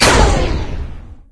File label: fire, weapons; wheatley; Source Engine; Portal 2